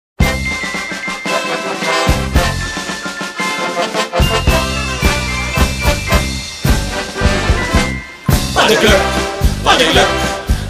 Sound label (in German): Jubilaeumsmarsch mit Gesang